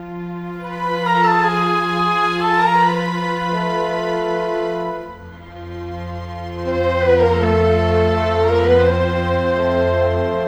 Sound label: Cinematic, Orchestral Series; Cinematic 05